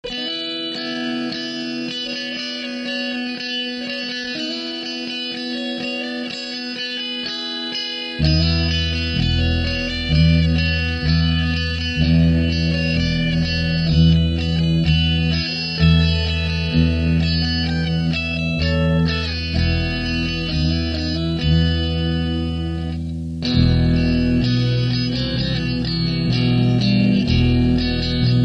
celtic clash

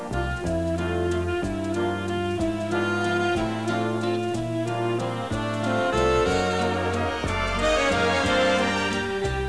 Viennese Waltz

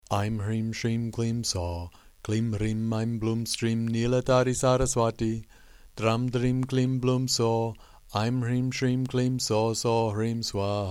Long Saraswati Mantra, sanskrit mantra

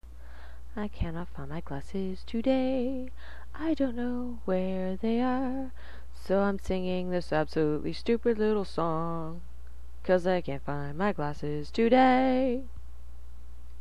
glasses

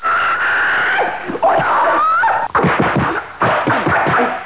scream; BruceLeeSF

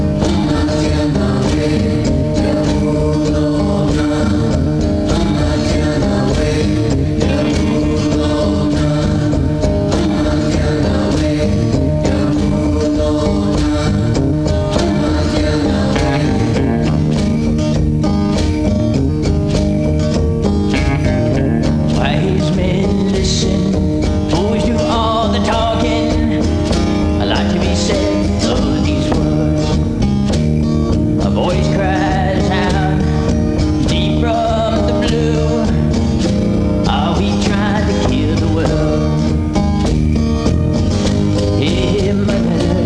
song, pow wow dance

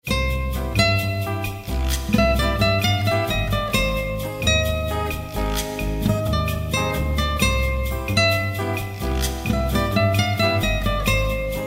bossa

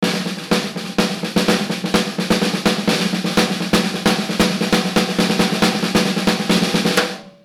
loop

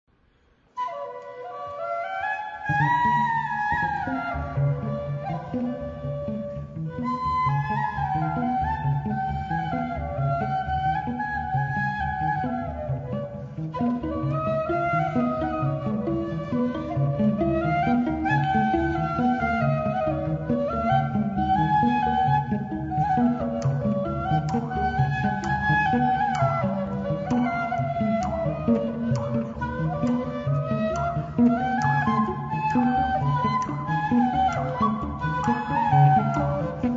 flute

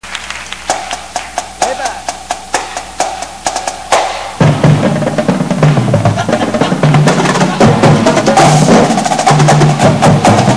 beat

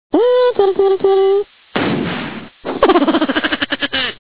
laughing

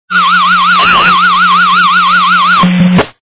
wave tone

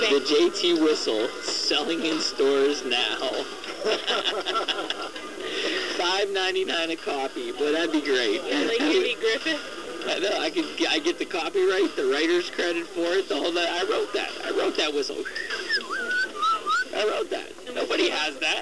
whistle

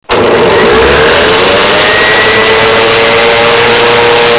blender; casa; objetos

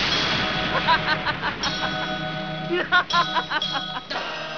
laugh; japanese

